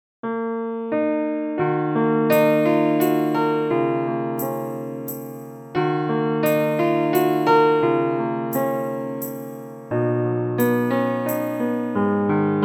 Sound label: Slow Waltz, Tanzmusik